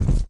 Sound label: stomp double